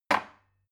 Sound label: glass put down cupboard hard